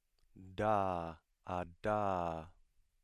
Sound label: voiced alveolar stop